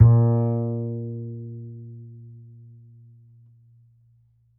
A, Upright Acoustic Bass

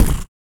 UP AIR, Vocal Blues Soul; Spectrasonics; Vocal Planet

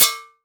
Iron Skillet, household rhythm samples Hits

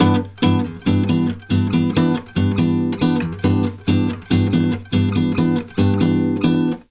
BOSSA G; USB Soundscan; AKAI; Brazil Bossa

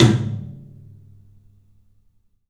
TOM, 3D Percussives

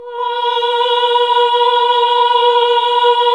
HAL AAH, Nuns Aahhh; Sound & Vision - Gigapack II CD 1 (Roland); HAL NUNS AAH OOH

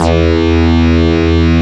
XFM BS, FM Syn Bass, Synth Bass

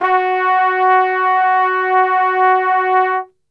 F#2, Brass; Best Service; Brass Super Section

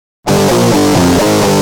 G Jam, Guitar; Industrial

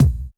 BD, Bassdrums, Roland TD-20

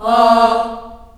A#2 CHS AH -L, CHILD AHS, Choir; Acoustic & Synth; AKAI; USB Soundscan vol.28

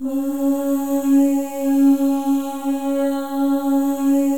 MOVING V1, Symphony of Voices; Spectrasonics

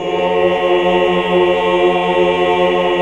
AAH

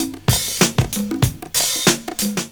PERCS, 70 s Breakbeats

